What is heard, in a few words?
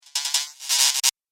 Sci Fi Factory Interference Sound; Sci Fi Music; Sci Fi Factory - Interference Sound 2; Andrew Fleming